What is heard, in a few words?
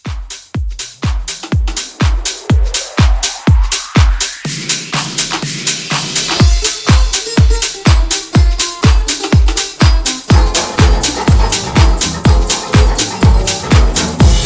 60s Pop House Mixshow Mix 123